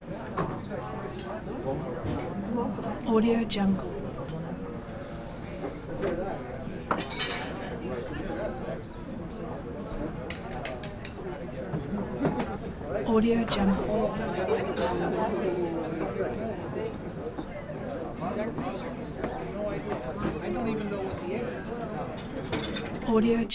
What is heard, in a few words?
bar walla; harvard gardens; prosoundfx